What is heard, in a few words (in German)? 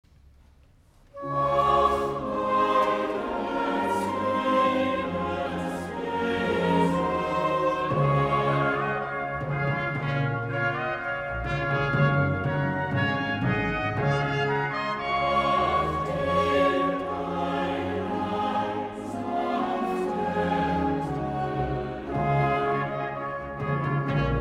choral; el dia de nadal segons bach